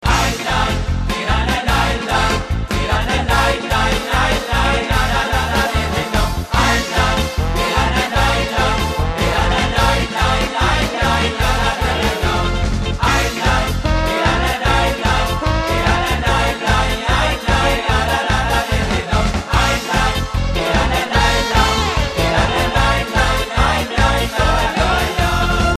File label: nigun